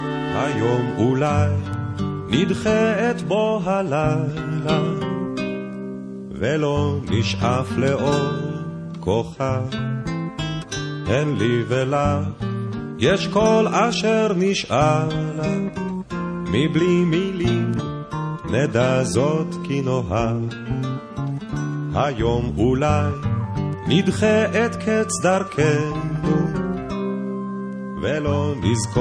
romantic song

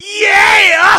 YEAHH

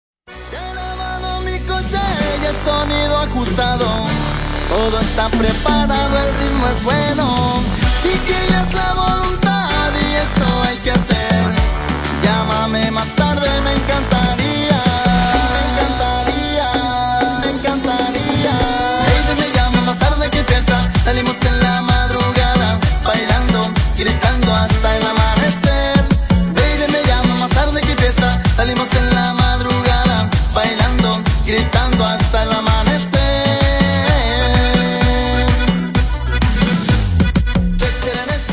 tribal version

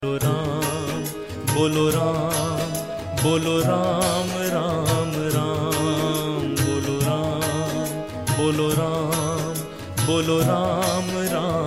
Ram bhajan, ringtones

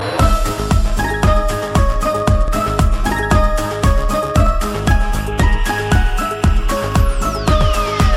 lucky tune

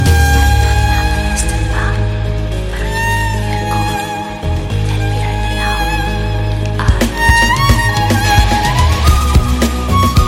Viola Solo

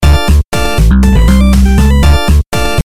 Boss Fight Notify

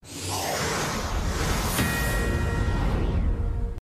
news alert; fox

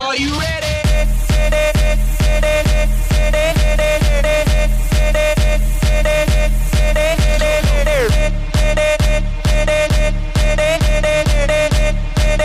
RNB soul

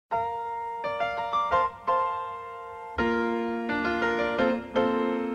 slient sms tone; ringtones

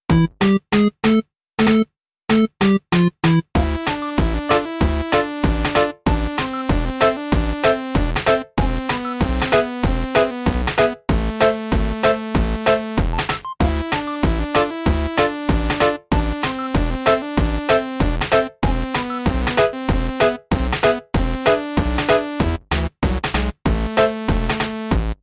8 bit

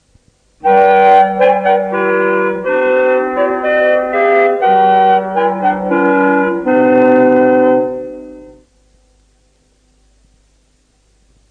kossuth radio signal; ringtones

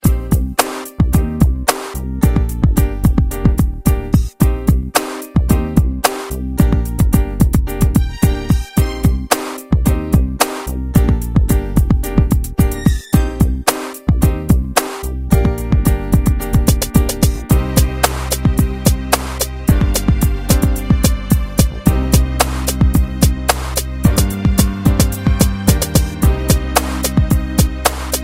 Rap Beat

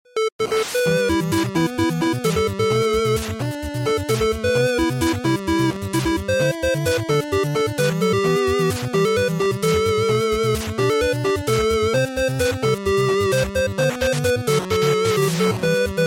8 bit

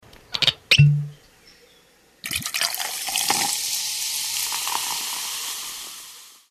beer open

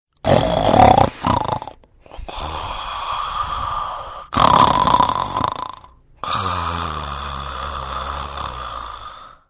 funny snore; children

